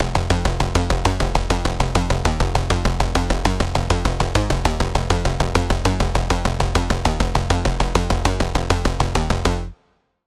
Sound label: Bass; Routed Sound; Spire Expansion - Doom Carpenter Vol.1